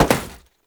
Step Metal Perforated, Foley; Warframe; Oraxia

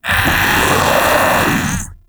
Infested Splitter Joined Vocal Alerted; Grey Strain

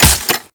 Ghoul Crutch Step Dirt, Footsteps; Warframe; Grineer Ghouls; Ghoul Drill Sergeant; Foley

